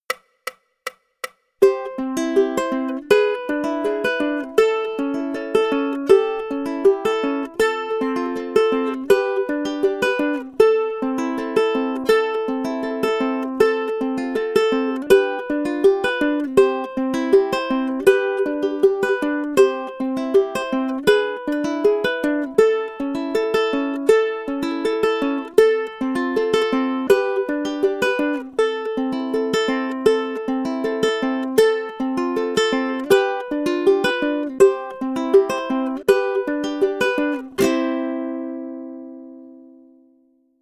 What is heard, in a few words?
Fingerstyle Study, ukulele; grade3